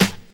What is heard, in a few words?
00s original snare sample g key